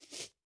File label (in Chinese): 犰狳环境声